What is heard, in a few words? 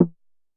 Drum X Conga A, Pearl